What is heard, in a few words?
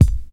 original steel kick drum sample F key